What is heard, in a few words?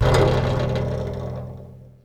radiator, Cartoon Film Effects